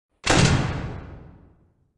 door sound; fnaf 1

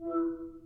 facetime connect

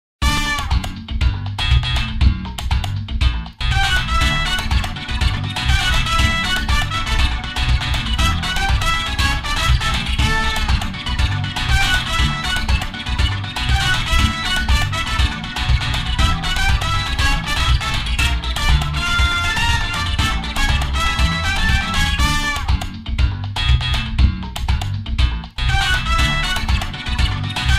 radio music; portal

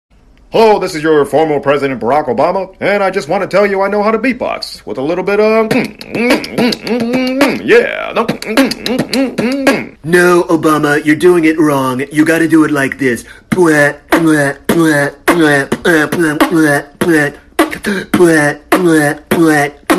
beatbox; obama

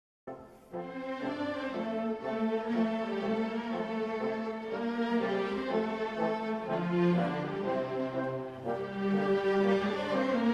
music box orchestra; fnaf

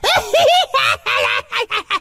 laugh; veigar

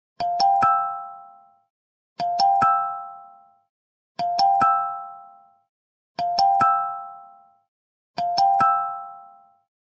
card approved

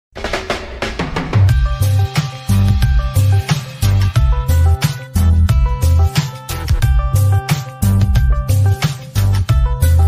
discord call remix

